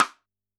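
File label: Snare Stick; drum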